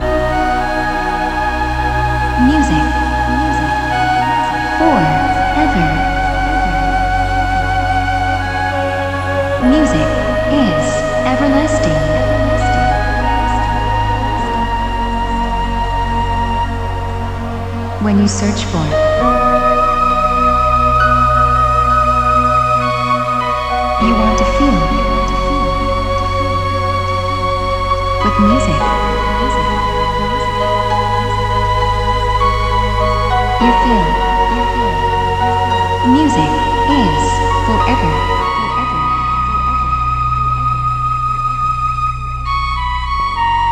music for ever electronica downtempo chill

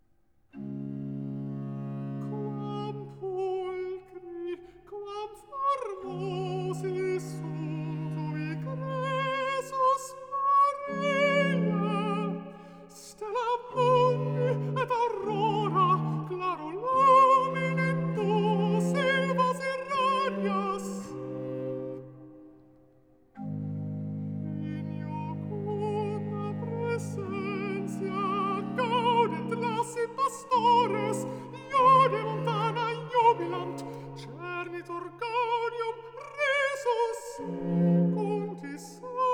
recitativo alto

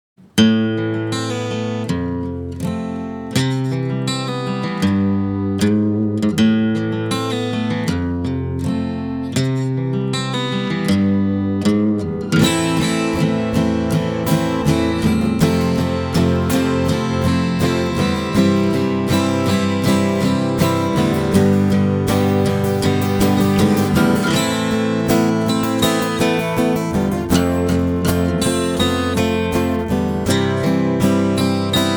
acoustic

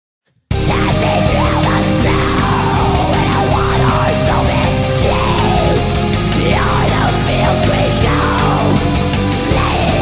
jingle bells black metal christmas

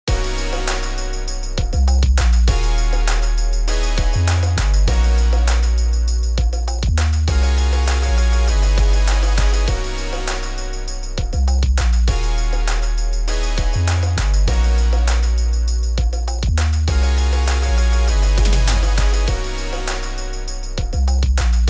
fanfare; confetti

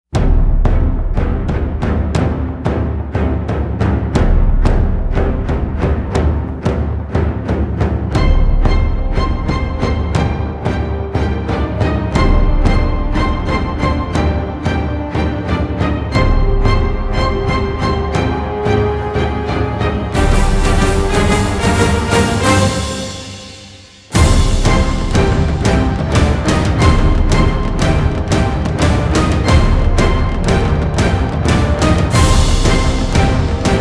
no choir

